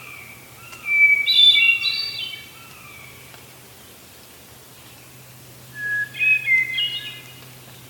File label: Hermit Thrush, Catharus guttatus; Active: Spring